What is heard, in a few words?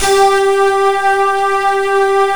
GLASSVOX G4; M1+D50